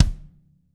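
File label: bass drum, Drum 1 Dry; East Collexion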